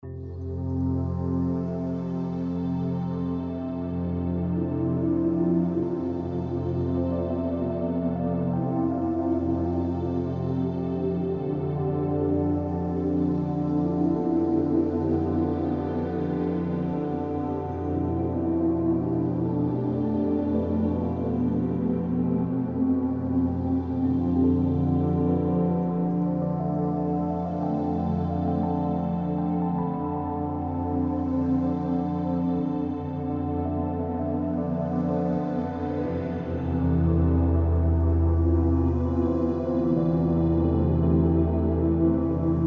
Amb Sound Scape Rhodes piano, Sweeping FX and Chords; Musical; Ambient SoundScapes